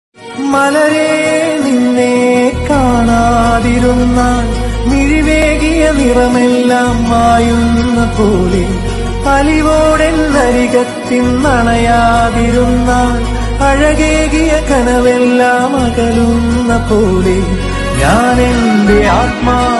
music ringtone; premam

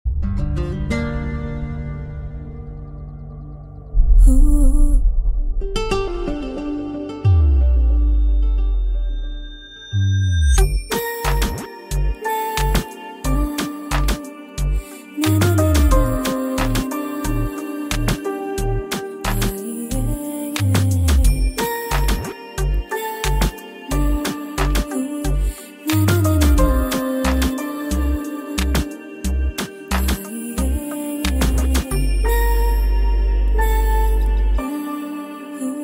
heart touching ringtone

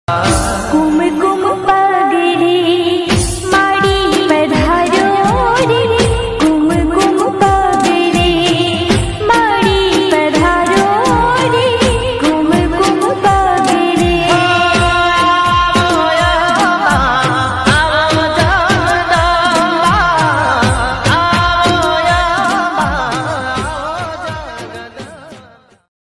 garba ringtone

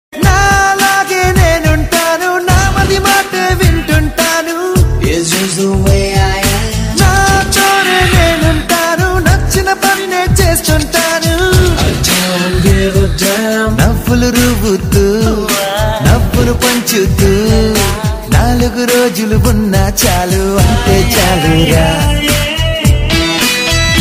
telugu movie ringtone; chukkalo chandrudu

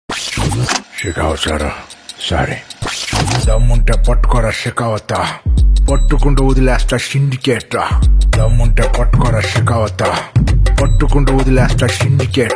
epic bgm ringtone telugu; pushpa 2 the rule; shekhawat

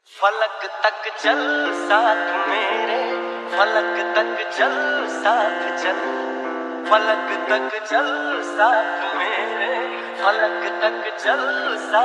slowed lofi

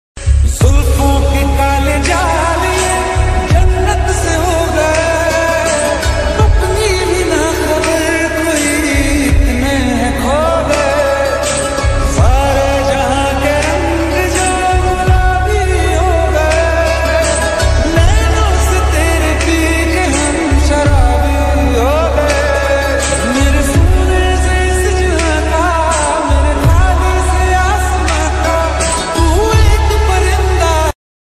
sad ringtone instrumental

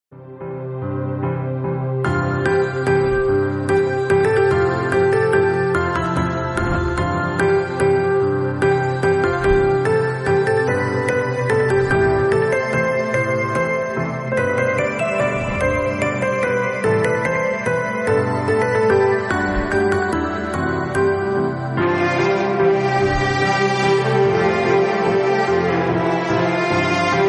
rabindra sangeet music instrumental; banglar mati banglar jal

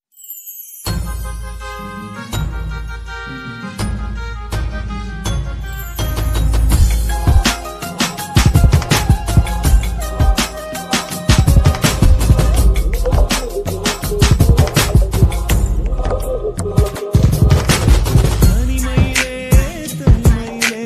bgm remix heart beats

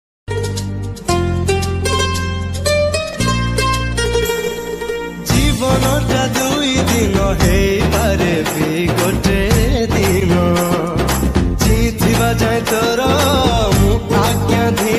jagannath bhajan ringtone, odia bhajan

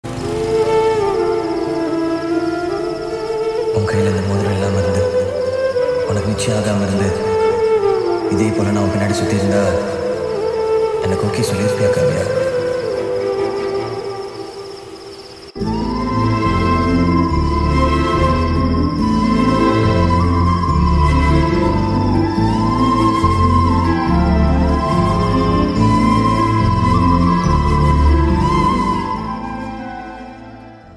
sad flute bgm

